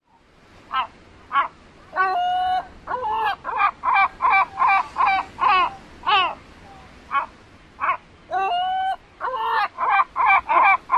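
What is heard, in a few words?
laugh like outbursts, Great Black-backed Gull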